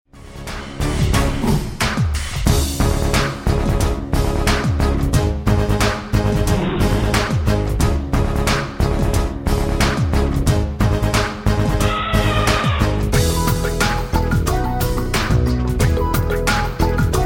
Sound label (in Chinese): Karaoke, 兒童詩歌